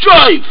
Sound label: drive; racing; internal combustion